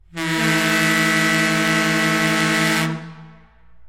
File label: Leslie A 200s, horn honks